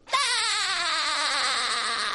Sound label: laugh; spongebob